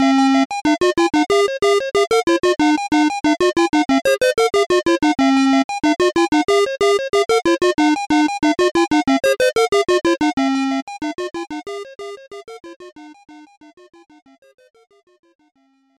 Level BGM

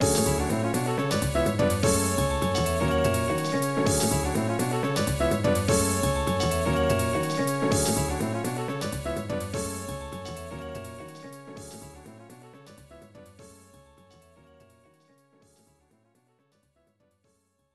Winning Theme; Vampire Savior The Lord of Vampire; CP System II; arcade; GALLON

